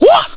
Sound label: Hit; forest law